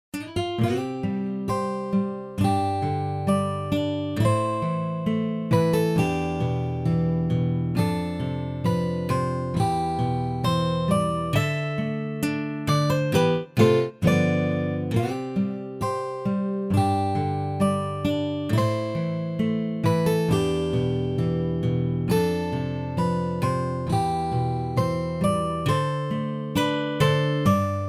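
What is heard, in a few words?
guitar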